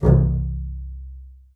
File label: C1, bass spicc; Xsample VOL-5